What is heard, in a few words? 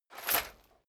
metal chain movement on plate single